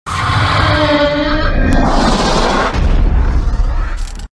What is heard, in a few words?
Mothra Larva roar